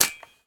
ventil no ammo, weapon; metrocraft

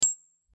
bullet hit metal; metro

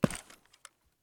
concrete footstep

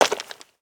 stone footstep

